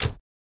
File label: Car Door Shut